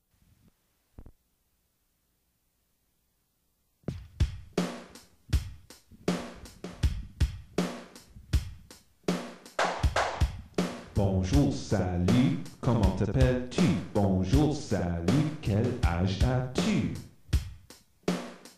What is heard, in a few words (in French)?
bonjour salut rap